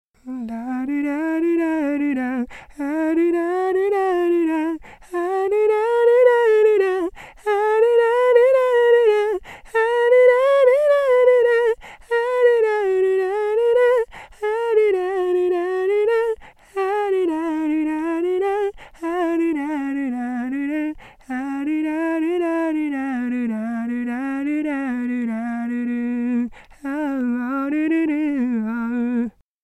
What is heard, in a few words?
vocal